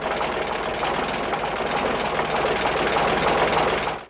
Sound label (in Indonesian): suara mobil